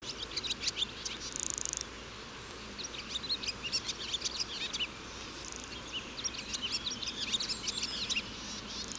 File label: Hirundo rustica; Chordata; LandBirds